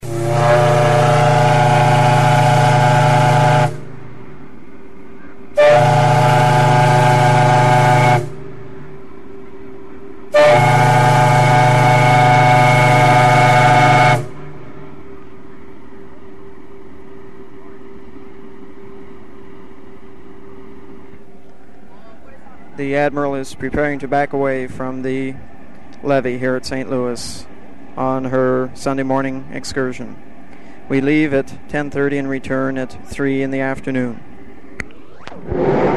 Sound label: steamer steam whistle; 1969; admiral